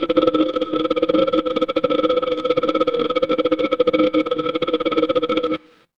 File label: mechanical gear